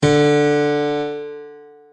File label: D3 piano; notes